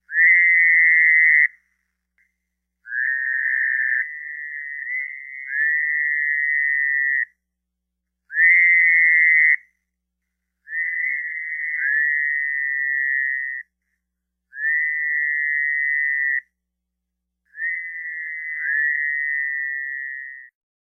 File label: Fowlers Toad call